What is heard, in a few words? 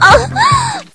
moan